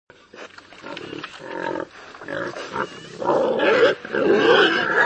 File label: Pig oinking